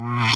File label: button